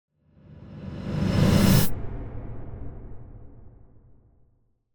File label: Metal Reversed Impact Sharp Metal - Danger; Hollywood Edge; sound designer's toolkit v2